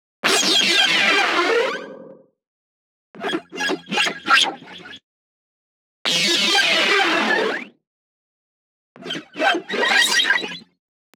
pulse laser, bass

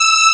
STRNG; sound canvas

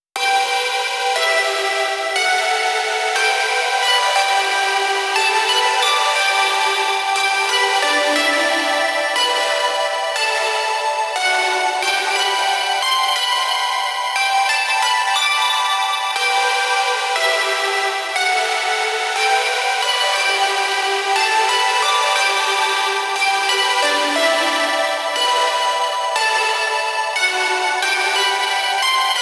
bell lead; Gibbest

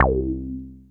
JUP.8 C3, JUPITER 8, Rare Synthesizer; Hollywood Edge; Giorgio Moroder Rare Synthesizer Collection

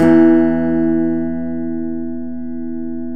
12 STRING; 12&or6 String Gtr; E-MU Producer Series Vol. 2; More Studio Essentials; Composer

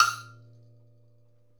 Wood Block High; Essential Percussion

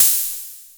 MR10 Cymbal, Yamaha MR10 drums

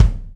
DW, DRUMS; Best Service; Alpine Volksmusik

